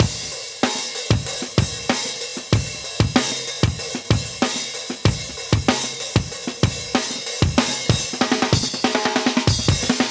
95 Crisp Triple Break, breaks, Live Breaks

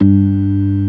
ORG. G2; B3 FULL

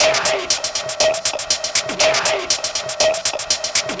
Combo Hat

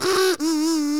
BIRD, bird call; Greytsounds VOL-1; BIRD CALL FX

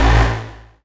Synth Hit A

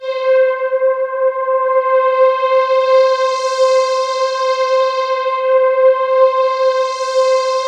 C4, SynthLine; 90 s Sample CDs; NiceBeats; SynthLine VOL-2

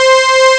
CZ STRING; Amiga Soundtracker Sample Packs

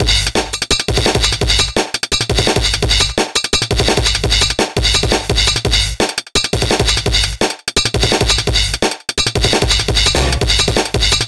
Jungle Bell Gate Beat; KJ Sawka; Drum n Bass & Beyond

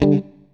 STRAT, GUITARS; Synclavier Sampler Library

